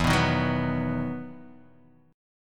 E Major 7th Suspended 2nd E, Guitar, Open D; chord shape

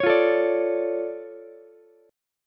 D Minor Major 7th, D, Ukulele; DmM7 strummed; JGuitar

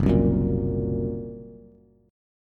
Gb Major Flat 5th, down, Bass; strummed; JGuitar

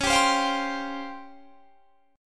C sharp Major 7th Flat 5th Guitar Standard; C#M7b5 strummed; 21,22,22,22,20,20; JGuitar

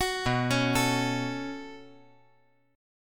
B 7th Suspended 2nd down, chord

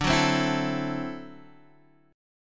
D 9th D down Guitar Half Step Down

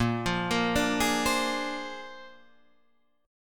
A sharp 9th A sharp, Guitar Open D; 8,8,8,8,11,10

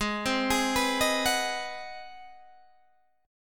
G sharp 7th Sharp 9th, Guitar, Open G; down; chord shape

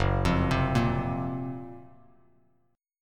Gb Major Sharp 11th, Bass Standard; arpeggiated; 2,8,10,3; JGuitar

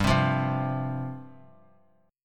G 7th Suspended 4th G down; G7sus4 strummed

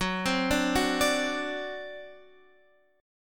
D 7th Gb, guitar

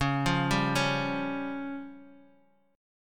C sharp Major Flat 5th, Guitar; arpeggiated; chord; 8,8,7,6,x,x; JGuitar

